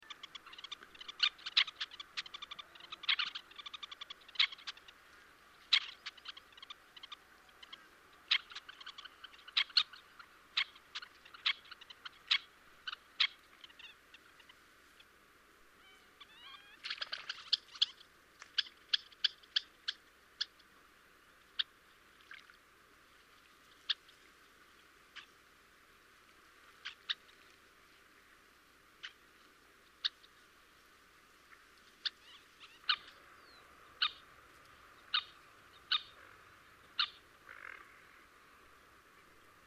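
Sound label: red-necked phalarope voice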